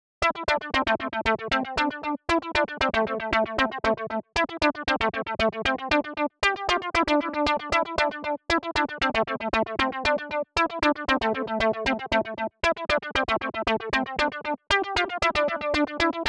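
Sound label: Melody 116BPM D minor 80s Classic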